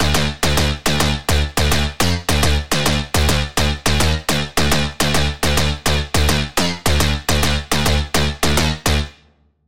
Pluck

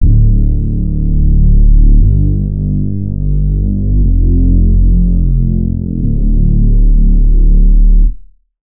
Reese; Death Threat; Routed Sound; Vital Expansion - Noble Gangster Vol.1

